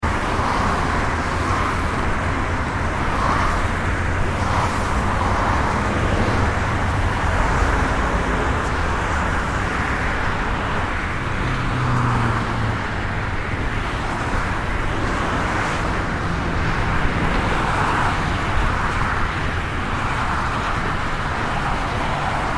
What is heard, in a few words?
Freeway Traffic Fast Short; Traffic noise from cars and trucks passing by at high speeds on freeway; Traffic And Car Sound Effects